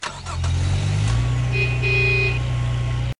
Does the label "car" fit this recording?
Yes